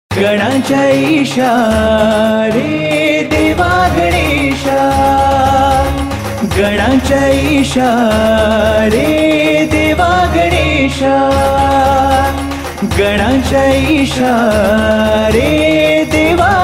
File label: Telugu Ringtone